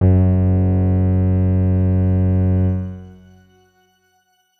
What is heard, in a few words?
X Grain F#1 pp; healing soundscapes; HSS Ableton Pack1